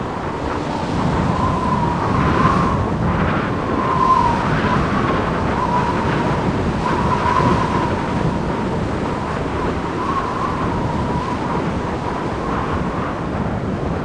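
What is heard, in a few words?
wind outside park loop; ambience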